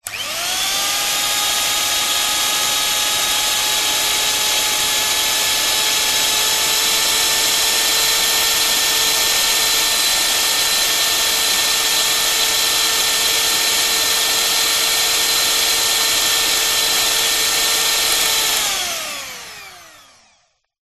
household food mixer hand held electric on off long burst